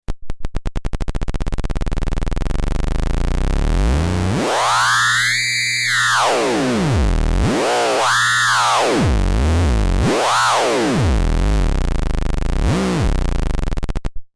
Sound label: optical theremin